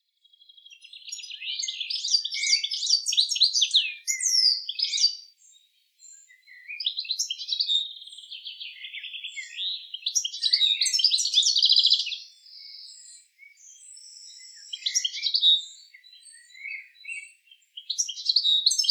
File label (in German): Vogel; Detmold; Timo Tetschner; xeno-canto